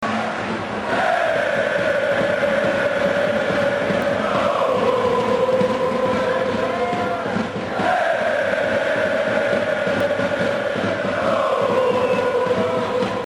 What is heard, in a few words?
eeehhhooohhh